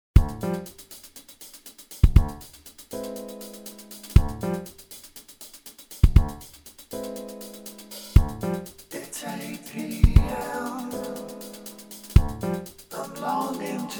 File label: Fartsynth